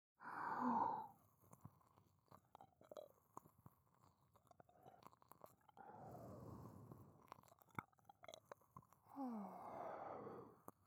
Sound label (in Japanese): 左耳舐め, ASMR